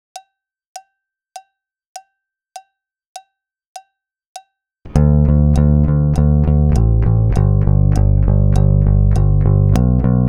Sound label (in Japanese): ダウン・ピッキング練習フレーズ